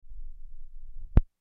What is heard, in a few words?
Record Needle Lift, Record Needle Lift Up; DJ; Musical; Blastwave